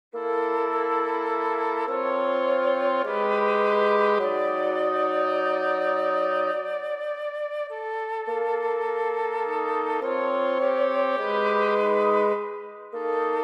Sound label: WW Trio